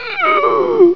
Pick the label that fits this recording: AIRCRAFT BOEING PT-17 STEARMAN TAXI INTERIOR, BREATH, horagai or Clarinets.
BREATH